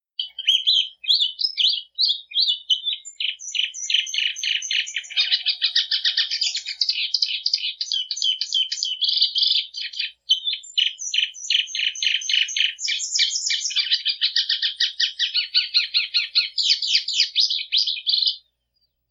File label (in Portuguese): Canto da Patativa